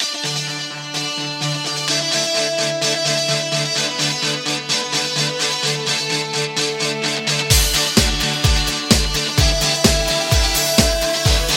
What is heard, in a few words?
guitarist sparkle; virtual guitarist